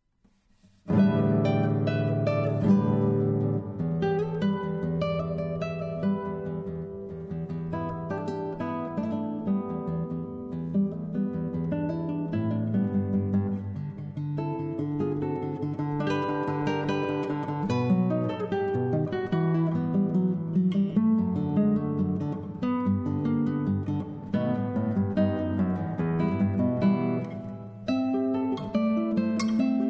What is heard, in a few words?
Takirari, guitar